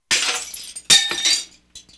household; GRSITES Graphics

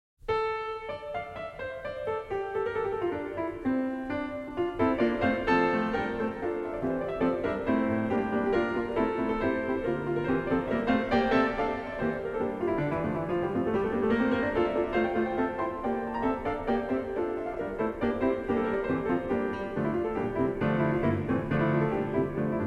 For 2 Pianos, Roll; Masters of the Roll, Vol. 31; Symphonic Dance No. 1; Percy Grainger; Cyrill Scott

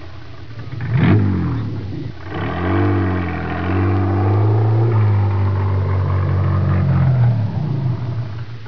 vehicle; GRSITES Graphics

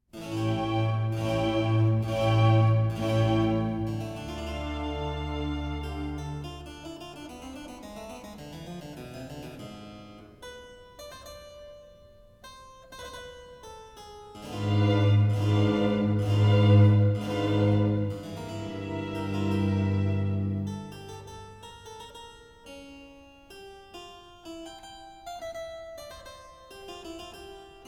Concerto in C for 2 Trumpets, Largo; Antonio Di Vivaldi; 25 Vivaldi Favorites

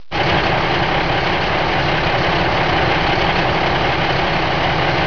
trucks; GRSITES Graphics

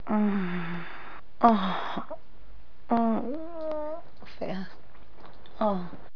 people; GRSITES Graphics

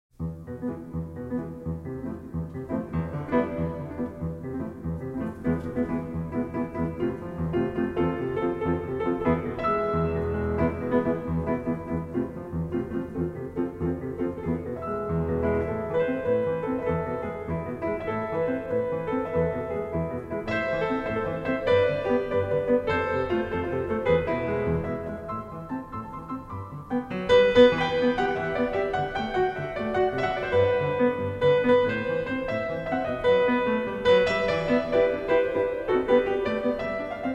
Spanish Ryhthms, Tientos, Piano Masters, Roll; Masters of the Roll, Vol. 32; Raoul Laparra

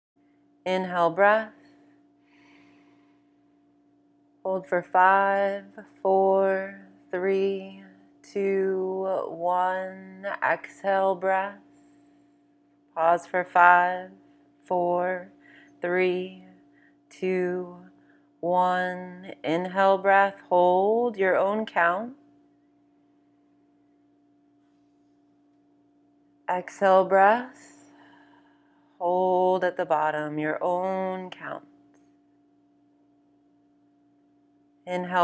breath intro; MEDITATION

